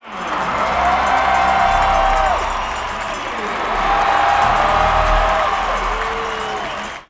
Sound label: cheer